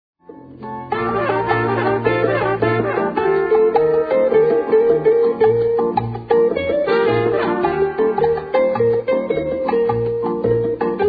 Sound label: Polka; Bell, Book and Candle